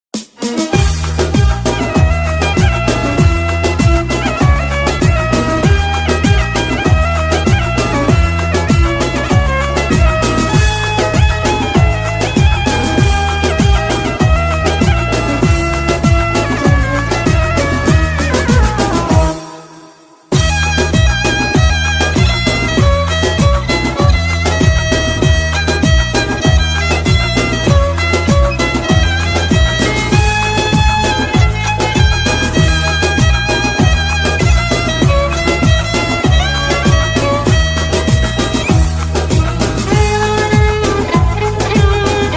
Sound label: Violin